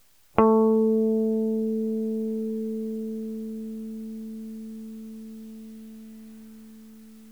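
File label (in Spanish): La armónico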